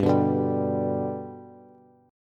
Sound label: G Major G down Bass; G strummed; JGuitar